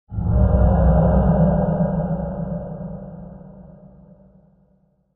Music Eerie Low Slow

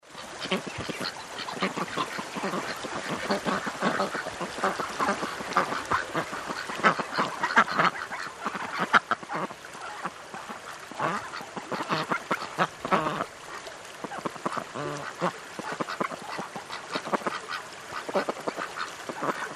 Ducks Chattering

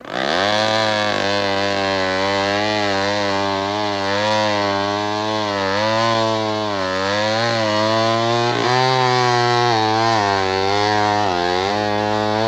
Chainsaw Cut A Tree; chainsaws

